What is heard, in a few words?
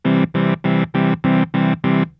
Nord Lead 4 arpeggiator poly